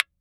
walkman, IR, Speakers and telephones

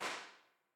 CMC6 MK4, impulse responses; Henry Olonga; The Beautiful Room

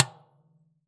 Dustbin, IR mono; Claustrofobia v1.1

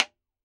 Erres tube radio, IR, Speakers and telephones

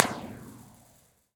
Cleft Ridge Arch, Underpasses, Impulse Response; EchoThief Impulse Response Library

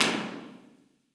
Hepner Hall, Impulse Response; EchoThief Impulse Response Library

